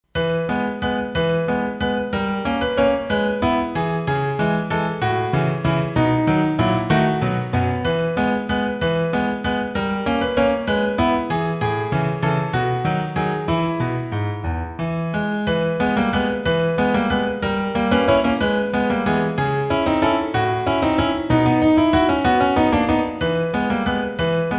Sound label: piano